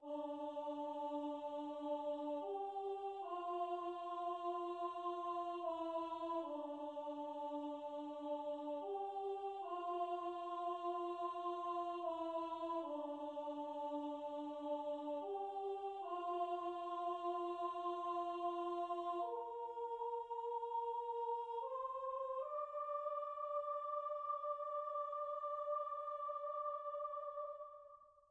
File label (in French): vocals brut